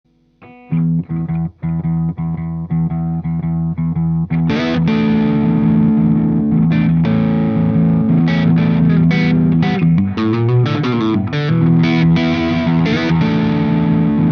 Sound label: LOW plus NORMAL; GRAVITY SPOT